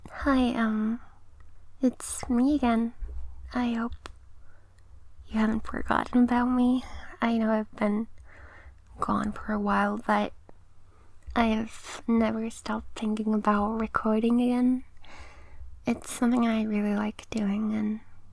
Whimpering, Moaning, Shy and awkward; F4A; I've missed you, dear listener!!; Giggling

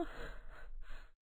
Moan

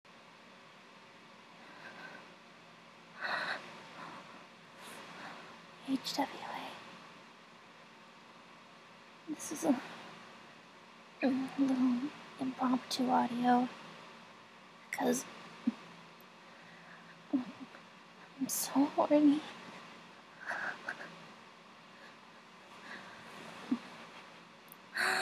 Mast, Girly noises, Begging; F4A; Attention Seeking

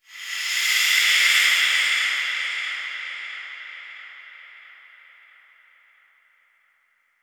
FX

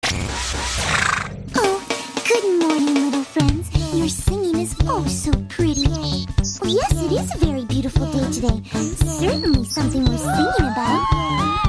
Polka

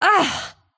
Grunt; Lewd SFX

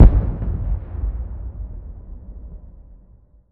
Explosion Gasbag; War Ambience; Prydwen

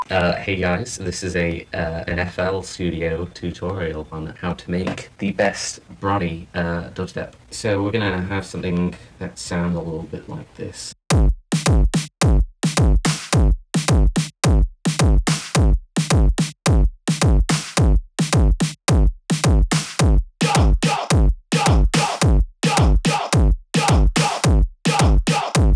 DUBSTEP; BRONY DUBSTEP

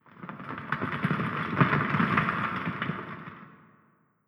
building rubble; War Ambience

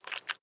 Sex Slide

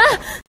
Short Scream; Lewd SFX

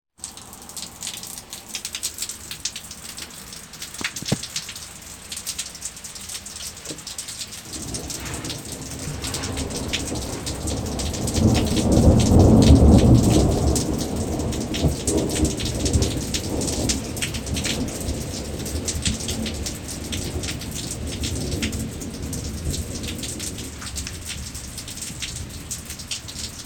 Thunderstorm

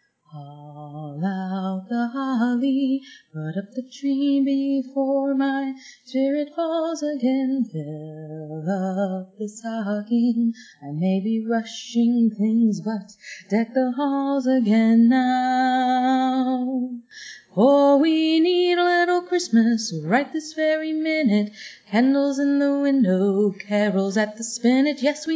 Christmas cover singing acapella

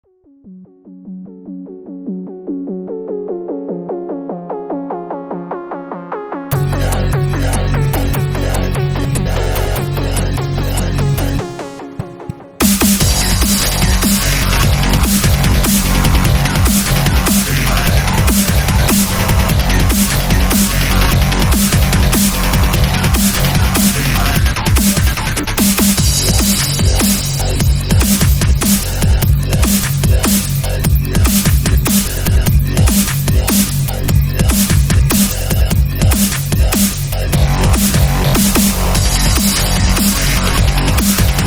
Remix Instrumental

